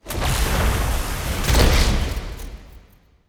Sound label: lightning